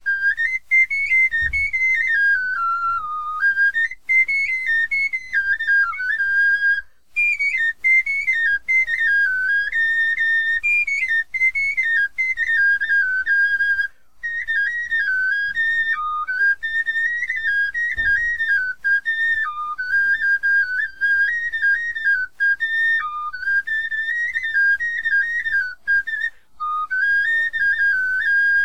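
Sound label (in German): melodie, Knochenflöten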